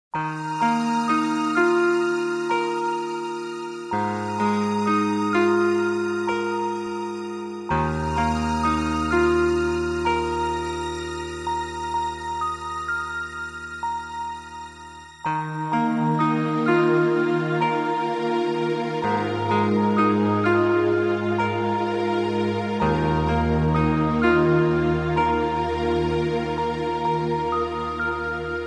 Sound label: Easy Listening & Classical; Sound Ideas